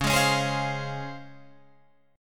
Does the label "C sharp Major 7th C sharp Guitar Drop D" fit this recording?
Yes